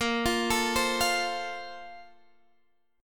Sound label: Bb Major 7th Suspended 2nd, Guitar, Open D